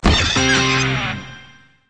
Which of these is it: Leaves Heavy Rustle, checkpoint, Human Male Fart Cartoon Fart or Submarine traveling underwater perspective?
checkpoint